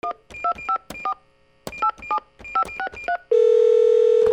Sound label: PHONES